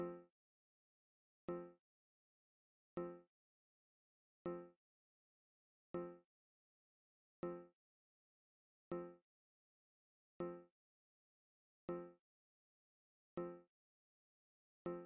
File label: piano magic